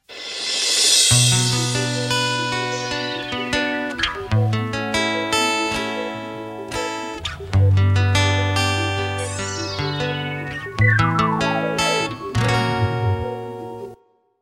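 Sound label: melodichnaya gitara, melodic guitar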